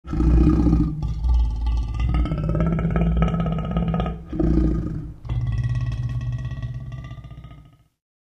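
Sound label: leopard voice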